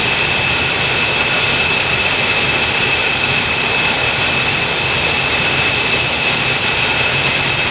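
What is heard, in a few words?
L-39 AI25 RPM3 front, aircraft engine; DCS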